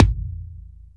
Kick, Akai MPC500; Toolkits

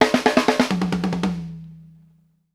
STANDARD1 R, Drumworks FILLS 84; Sampleheads; New York City Drumworks VOL-1; KD FILLS 84

